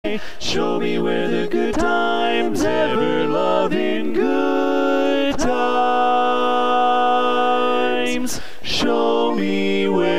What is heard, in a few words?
barbershop tags, all parts